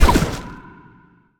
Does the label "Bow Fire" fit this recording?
Yes